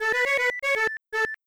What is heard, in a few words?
Nose Flute; gba high quality remaster; spongebob s atlantis squarepantis